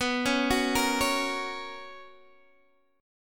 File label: B Major 7th Suspended 2nd B down Guitar DADGAD